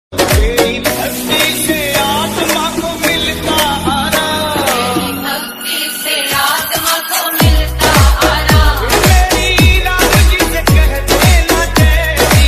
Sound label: bhakti ringtone; hanuman ji